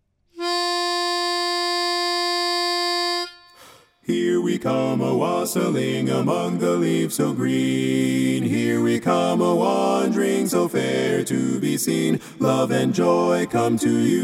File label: BASS